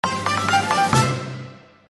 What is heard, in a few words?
BGM Fanfare